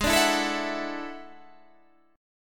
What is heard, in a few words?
E 11th Ab